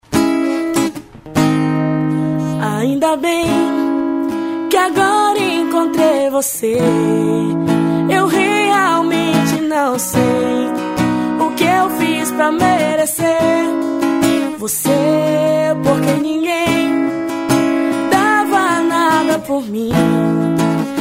voz e violao

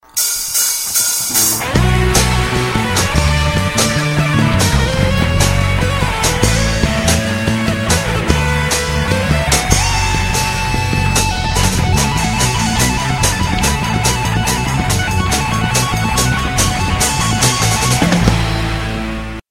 solo

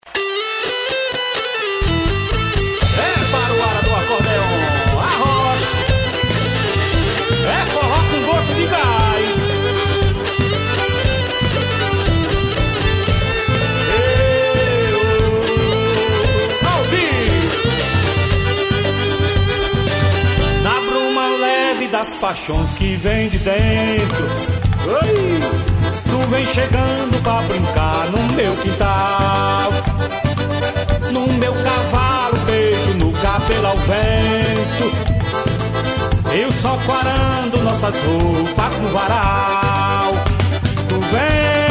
acordeom

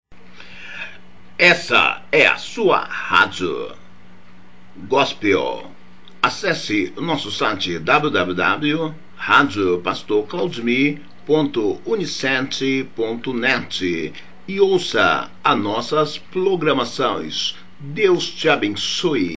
vinheta

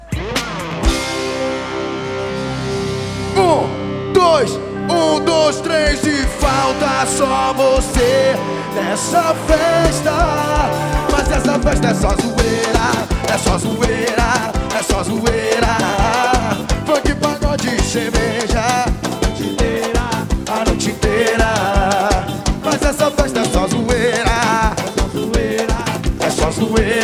baile ao vivo no trio; baile do pika pau

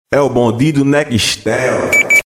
vinheta

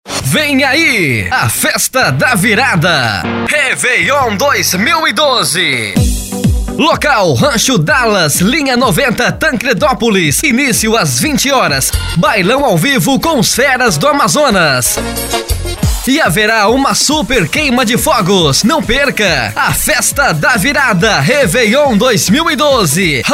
vinheta reveillon

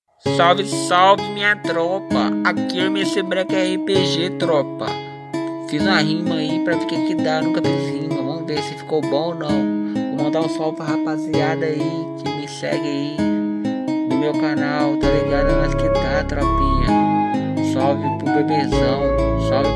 rap; free fire

